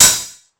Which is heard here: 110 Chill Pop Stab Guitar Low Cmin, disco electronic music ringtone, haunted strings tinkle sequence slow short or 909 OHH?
909 OHH